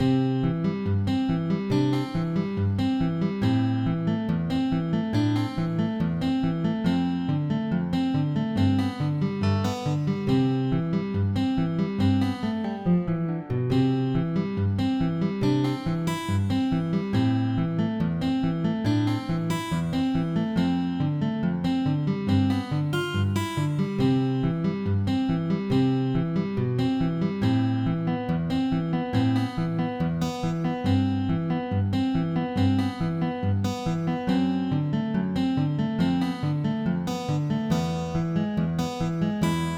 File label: Picking Mood